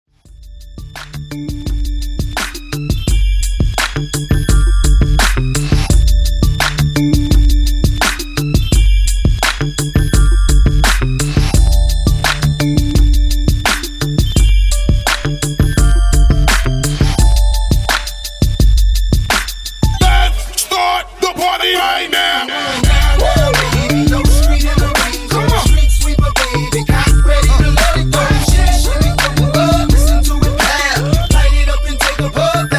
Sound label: Old School 85 Bpm